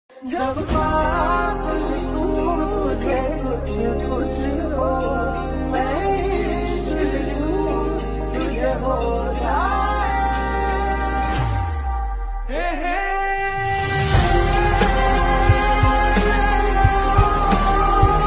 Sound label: sad ringtone